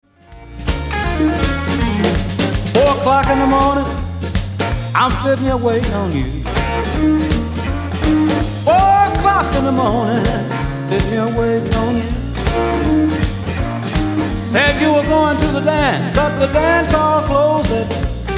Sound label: Blues